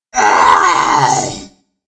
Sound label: death